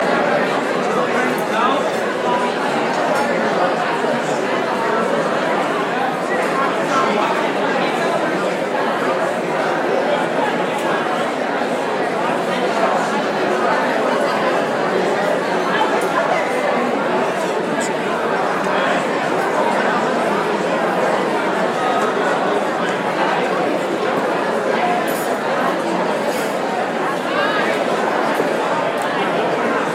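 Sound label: Huge Banquet Ambience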